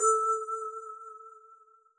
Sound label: ping A